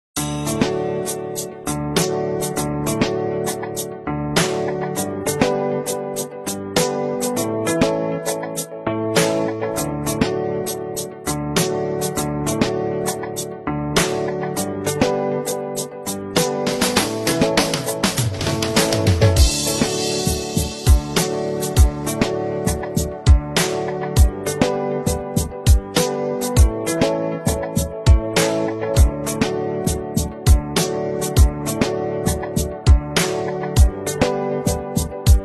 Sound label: Slow Jam Mix